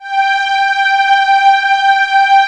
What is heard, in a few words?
WS WARM; Greytsounds VOL-1